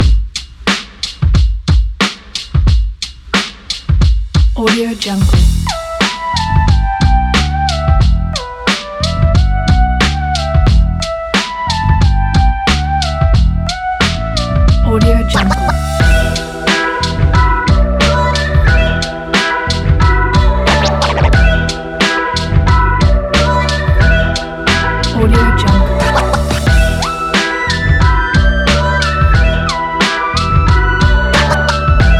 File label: oldschool urban hiphop